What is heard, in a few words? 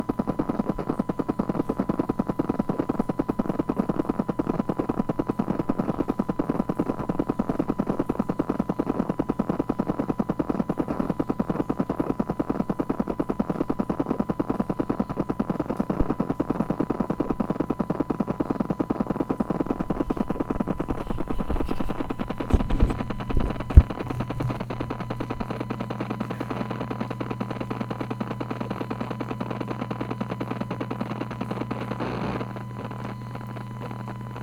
spinner